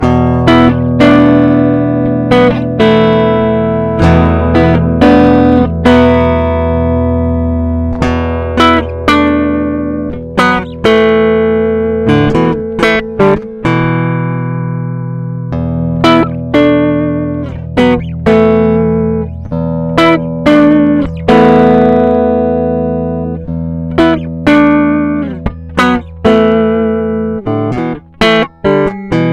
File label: GTR RIFF fx5